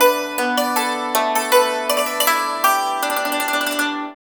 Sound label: YANG CHING, Heart of Asia; Spectrasonics